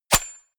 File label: golf ball hit